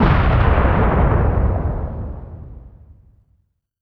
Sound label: Factory Explosion; gamerip; Plants vs. Zombies Garden Warfare 2; PS4, Windows, Xbox One